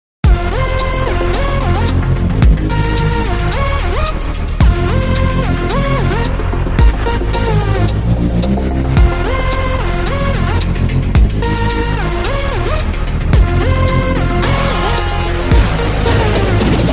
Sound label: Tone